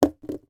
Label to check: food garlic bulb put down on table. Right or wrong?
Right